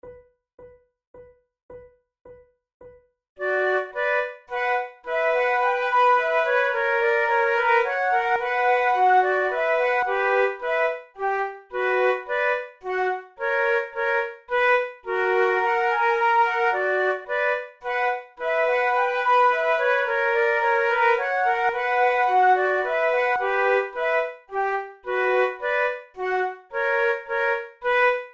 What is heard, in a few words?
minuet trio, flute